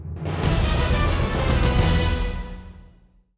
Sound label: Game Over; Castlevania The Arcade